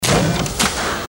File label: short industrial event